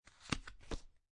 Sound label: Cards Playing Cards Dealing, Dealer taking cards from hand; Playing cards, poker, dealing cards; putting on table; Lynne Music